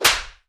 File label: slap, impact flesh; DoomRTX